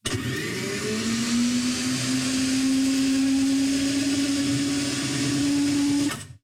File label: Aircrafts A-10 Canopy Close; DCS